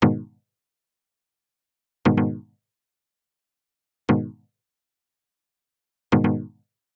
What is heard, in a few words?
stab dry